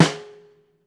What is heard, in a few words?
STR SNARE, Brazil Drum Perc; E-MU Producer Series Vol. 7 – Old World Instruments